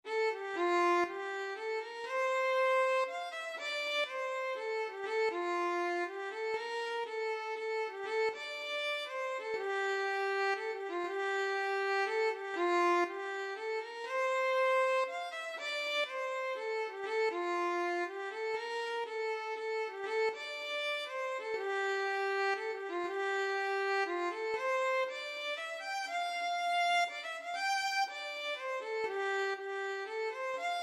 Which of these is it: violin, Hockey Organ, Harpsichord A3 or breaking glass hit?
violin